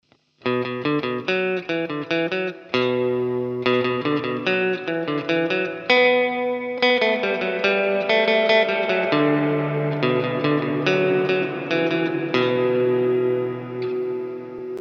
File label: Spring